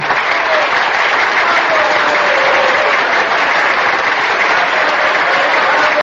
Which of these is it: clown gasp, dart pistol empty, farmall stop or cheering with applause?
cheering with applause